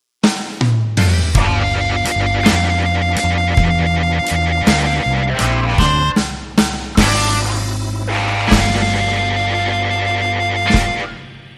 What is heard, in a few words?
Slow Harmonica Blues; Sting